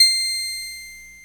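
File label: ORG Vox, Continental organ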